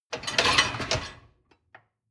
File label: dishwasher lower tray draw in short